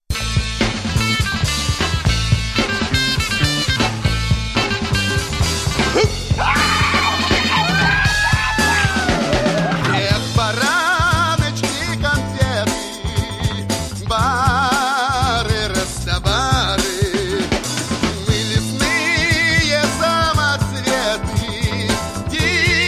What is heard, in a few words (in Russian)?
песня из советского фильма